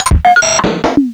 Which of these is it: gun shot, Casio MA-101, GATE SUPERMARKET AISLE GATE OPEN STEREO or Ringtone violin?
Casio MA-101